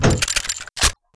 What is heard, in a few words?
turret ammo load